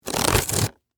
Cardboard Seal Rip, Foley, Cardboard, Seal, Rip, Perforated; BlastWave